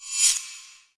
archmage precharge

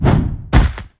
boss mono salto totem

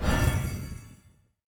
heroes lumenir light companion basic attack

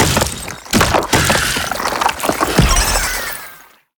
spiders enemy spider priest transform

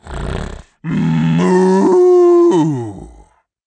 Minotaur death

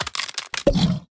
transform; crocs; crokinder

